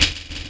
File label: loop, techno atmos